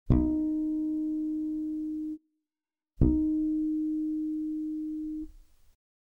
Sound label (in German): kuenstliches flageolett